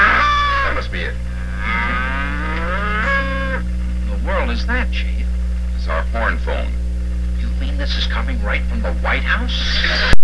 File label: horn; phone; hall of hush